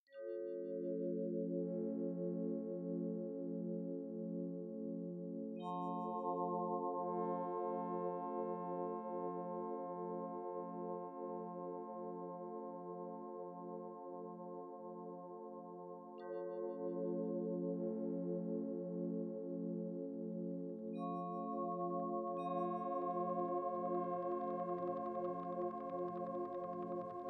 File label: Kings chamber chords